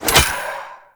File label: Spear Reload, Weapons; Warframe; Tenno; Priest Spear